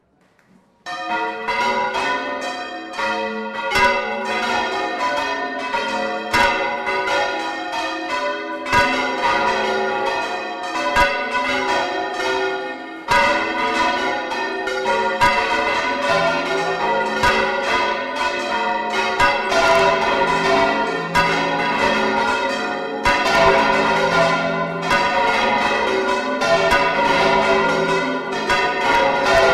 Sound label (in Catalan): campana